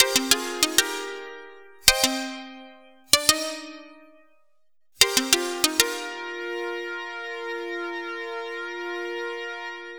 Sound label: Melody, IDM